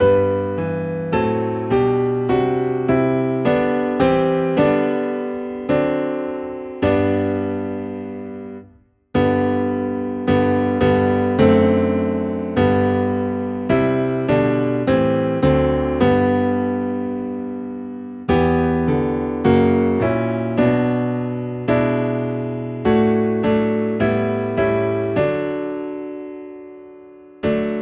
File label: Piano Low in G, hymns; Spirit of God Descend Upon My Heart